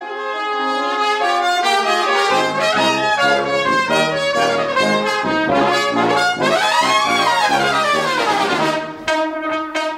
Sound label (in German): trompete